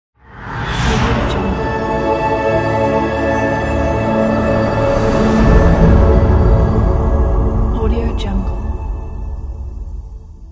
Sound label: logo reveal